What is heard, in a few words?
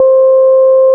flute C3, FLORGAN; Analogon; FLORGAN 2